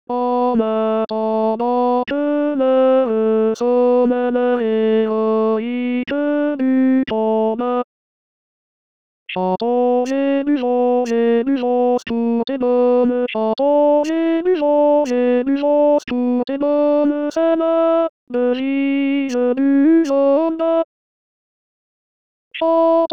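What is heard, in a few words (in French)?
ténor voix seul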